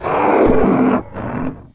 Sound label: ambient, statue